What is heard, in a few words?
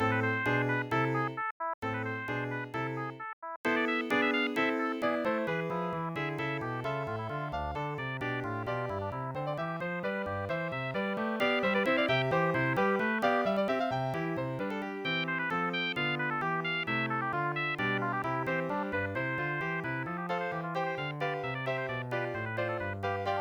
SONATA